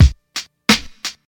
87 bpm 00s hip hop drum groove c sharp key, kick tuned to the C# note